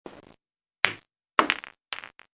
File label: 8 POOL